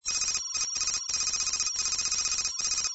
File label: typing characters; emwin